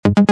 sms alert synth; Umidigi; F1 Play

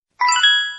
Crisp Bell, notifications; Lenovo A806

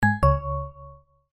disconnect penguin; Samsung SGH-F310 Serenata

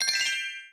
Magic Trick; Reality Bytes

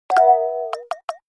battery dead

